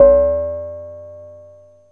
ANALOG BELL; Zero-G; Ambient 1

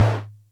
Music Percussion Computer Tom, Drum Machines

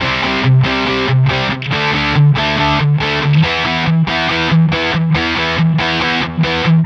Distorted Guitar 140BPM Emin; Distorted Guitars; Vengeance - Essential Clubsounds Vol.4; Guitarloops

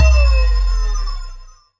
D+B

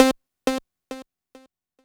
C4, Synth Line; 90 s Sample CDs; NiceBeats; SynthLine VOL-1

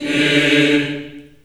D3, Choir

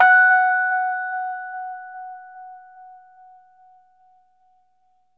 Rhodes octave F#, L; 3D Sound; 3D Keys VOL-1

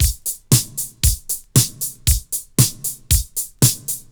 116, LOOPS; Best Service; Hyper Dance; 90 s Sample CDs

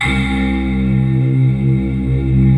SYNTH PAD

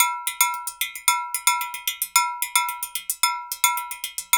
110 JNKNO, Junkanoo; Spectrasonics - Supreme Beats - World Dance

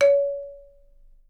C#4, Percussion & Harp; Miroslav Vitous - Percussion & Harp

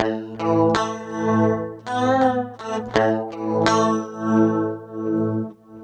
CLEAN, CLEAN GTR

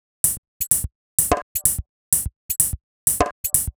Top 127bpm, House Drumloops; Zero-G House Fabrik Drumloops; ZG Drumloops

